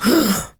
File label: Injured Grunt